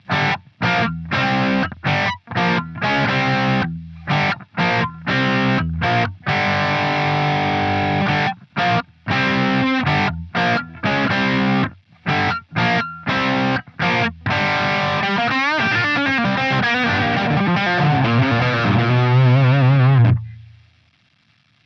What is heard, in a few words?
lead bridge gibson; custom77 cs4